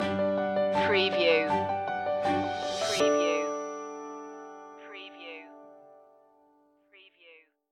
Music stinger

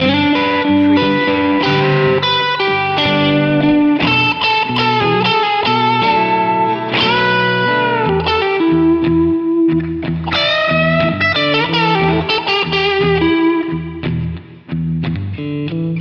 slow blues loop

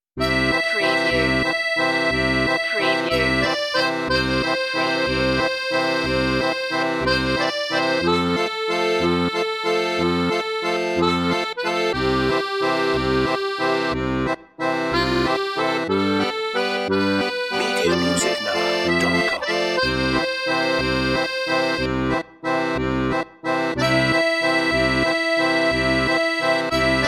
accordion

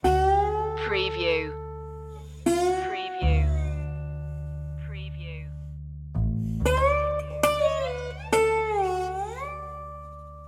western loop

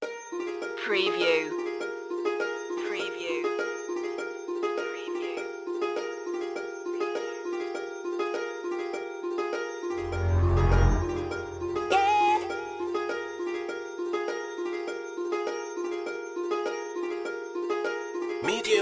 trapped no drums

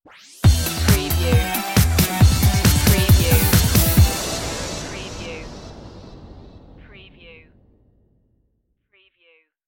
digital kutz stinger

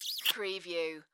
Kiss Smooch Female; Sound Effects Bible

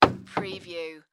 Cabinet Door Close

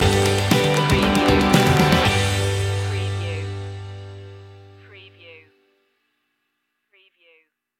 morning motivation stinger

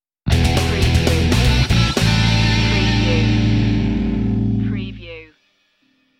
rock sting

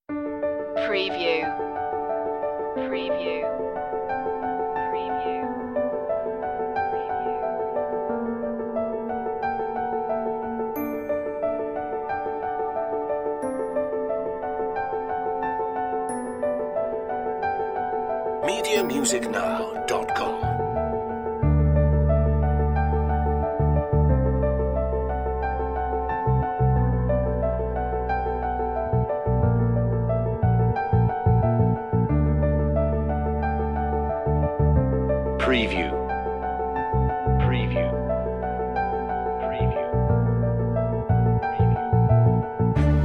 inspired progress no drums

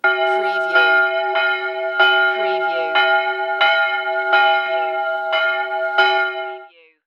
Town Bell Venice

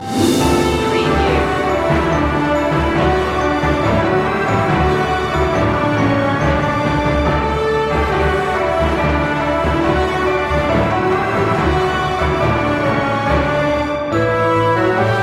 orchestral loop end; deck the halls; media music now

